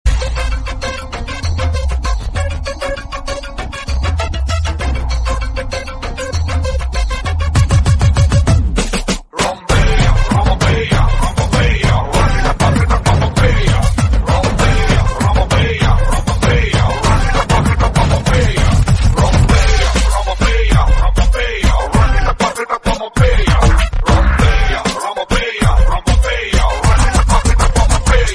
kannada hip hop tune

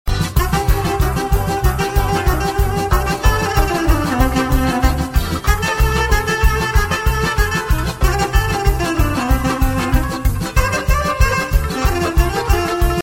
superb music

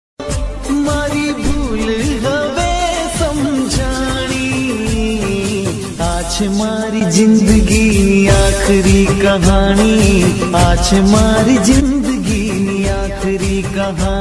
gujarati sad

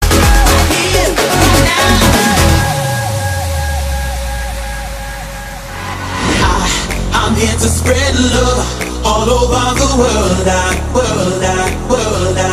electro

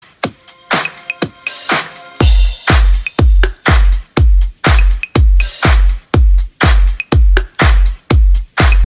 electro jumping vibes

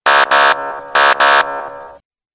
police truck notify

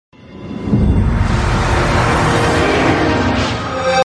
xbox startup

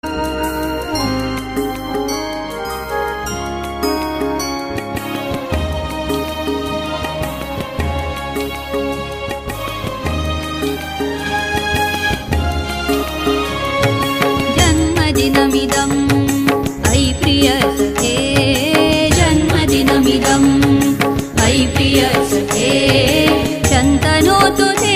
janma dinam sanskrit

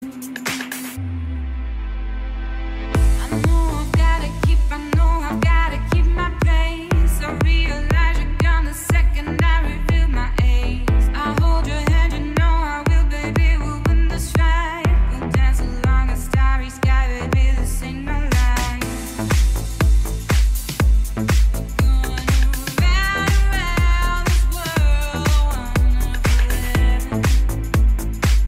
dance Samsung ringtone

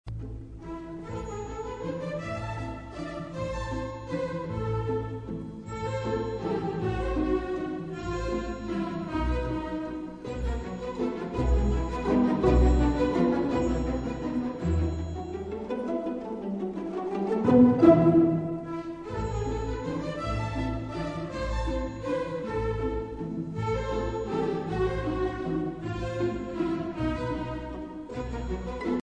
classical, ringtone

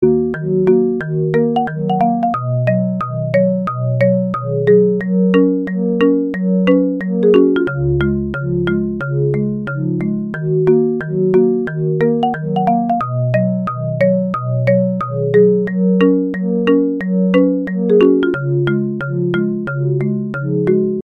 marimba

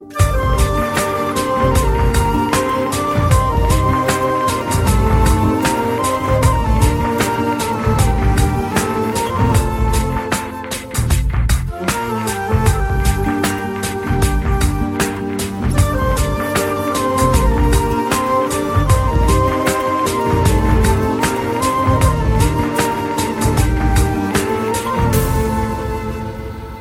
Bollywood instrumental ringtone

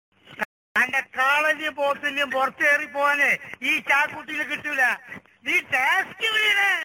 malayalam funny tune

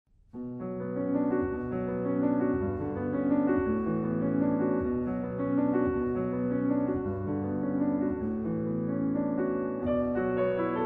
calm piano music

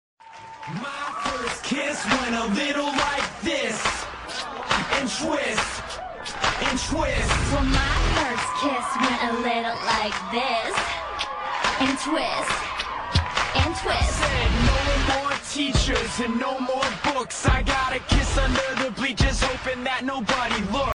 hiphop

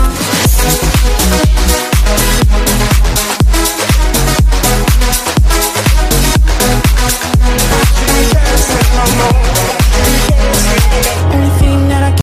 electro ringtone

